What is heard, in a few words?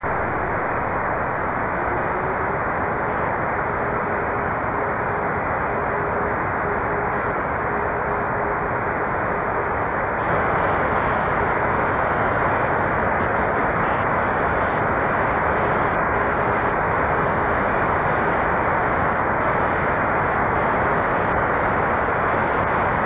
6m eme; jh2coz